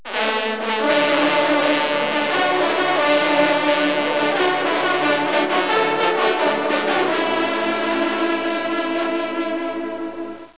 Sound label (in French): trompe